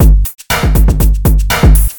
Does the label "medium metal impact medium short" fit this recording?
No